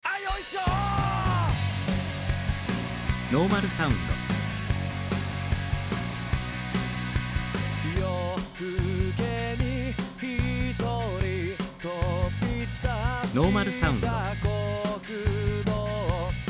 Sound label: band